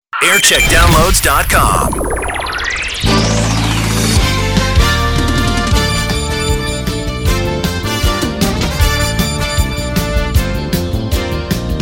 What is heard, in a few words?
jingle montage; key 103